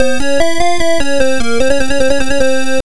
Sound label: all treasure collected, msx; kings valley; konami